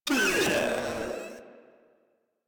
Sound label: Proto Infested Pain, Enemies; Warframe; InfestedNinetyNine